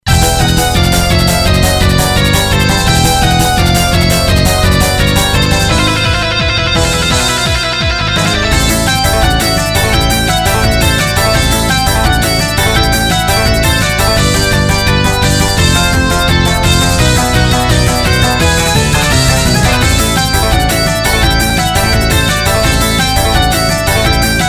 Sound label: bossbattle